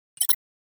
HUD futuristic button selection

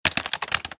Mechanical keyboard typing

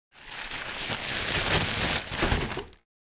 Skiing skier falling down on snow

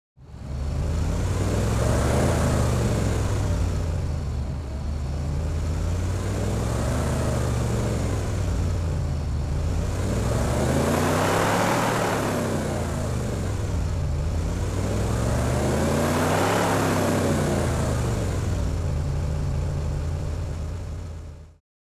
Sports car engine exterior revving

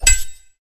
Two swords clash together with reverb

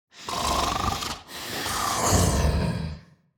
Demon king lord snore sleeping

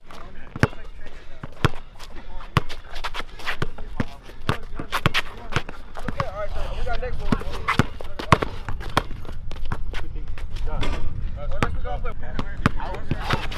Basketball game ambience street court

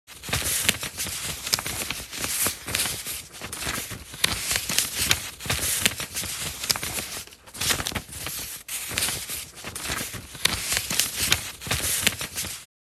Paper shuffling and organizing office supplies

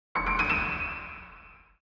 Old vintage cartoon sneaky steps

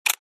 Futuristic click

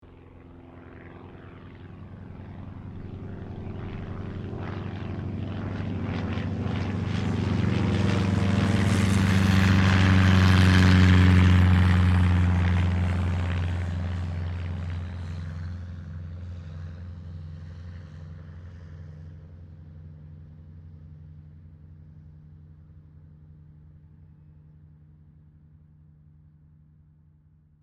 Light aircraft fast overhead passby

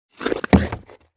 Ice hockey puck hit board

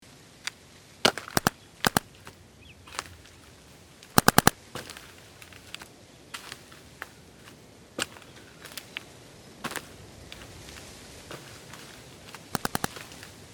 Paintball field ambience paintball guns

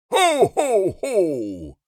Santa claus jolly laugh; christmas

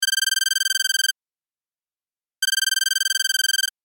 Old cellphone ringing loop

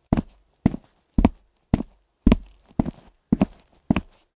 Footsteps walking on wood in dress shoes

